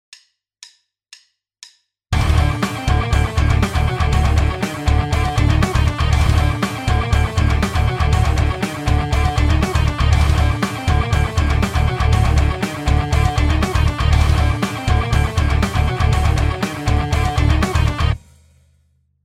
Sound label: rhythm